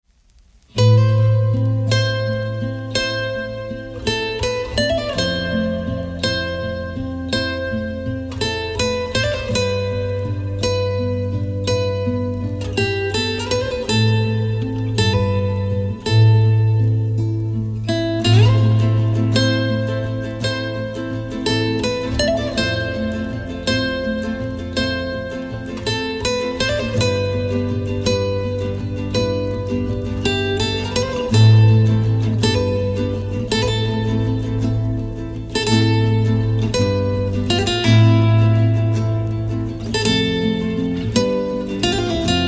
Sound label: guitar version